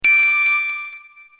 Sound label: Light Chime; Relaxed; Blackberry Bold